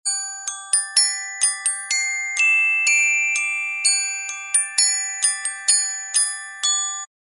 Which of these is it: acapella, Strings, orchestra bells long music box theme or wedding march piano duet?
orchestra bells long music box theme